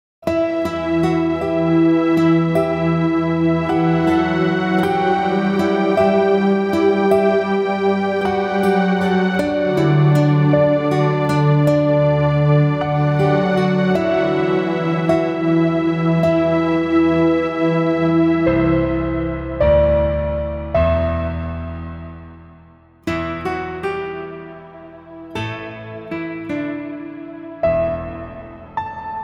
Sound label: piano